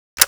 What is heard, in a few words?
Alt Fire Switch, weapons